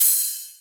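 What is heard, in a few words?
open hi hat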